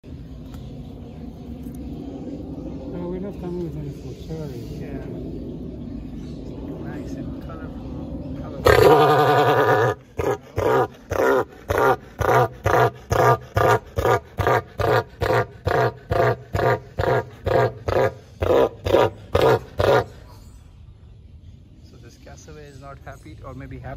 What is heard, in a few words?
cassowaries; صدای پرنده کاسوری